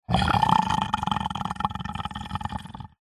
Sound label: horror monster short guttural growl